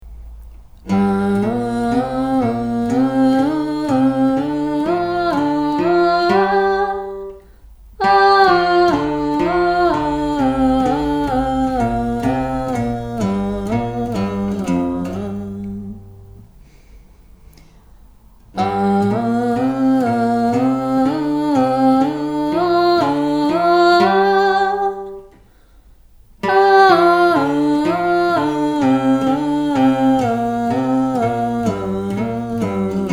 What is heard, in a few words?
Minor pentatonic sequence on one chord slow